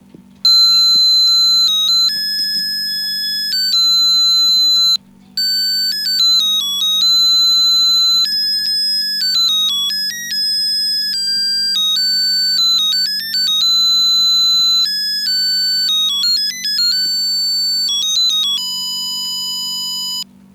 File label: ave maria, melody